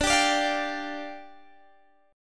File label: D Minor Double Flat 5th, down, Guitar, Open G